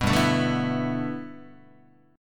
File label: G 6th Add 9th G Guitar, chord; G6add9 strummed